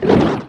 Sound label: knight of windstorm die